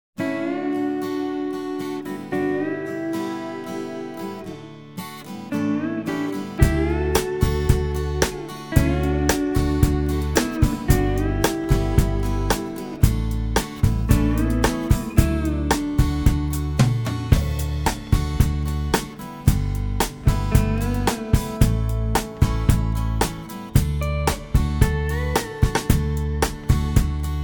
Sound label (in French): country ballade pour chanter; chansons bande orchestre cycle 3